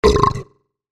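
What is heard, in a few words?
belch male deep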